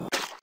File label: Fruit Impact